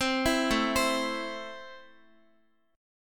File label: A Minor, Guitar; arpeggiated; chordshape; x,x,10,9,9,7